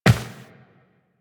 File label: Step Default, Footsteps Walk; Warframe; Tenno